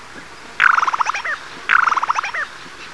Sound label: Pterocles orientalis; pticy; golubi